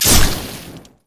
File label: basicattack; yasuo